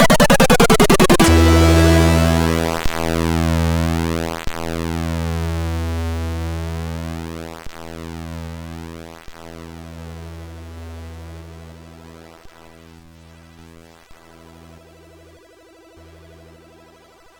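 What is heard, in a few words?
Rockit, MOS 6581 SID chip music, games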